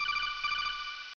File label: call; metal gear